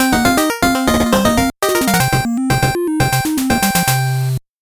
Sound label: 8bit jingle slow